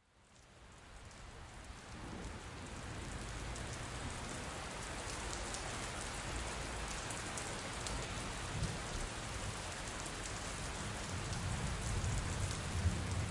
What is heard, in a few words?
rain Start; Food Farmer